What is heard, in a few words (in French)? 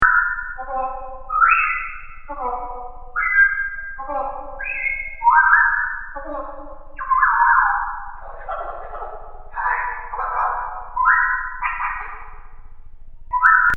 le reveil tranquille, sonneries portables